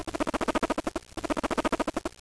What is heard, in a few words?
cavy content